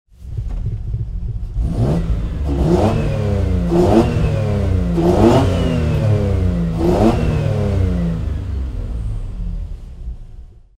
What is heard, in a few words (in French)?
vehicule arret